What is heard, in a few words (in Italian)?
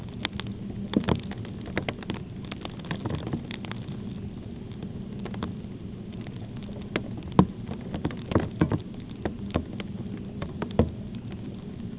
geofono 200kHz; aquased